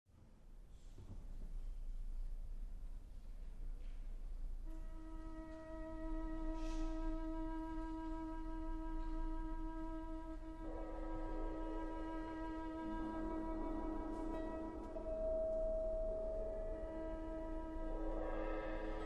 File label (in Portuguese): concerto para violoncelo e conjunto de câmara; "Lumen de Lumine" concerto para violoncelo e conjunto de câmara. (1996/97); Harry Crowl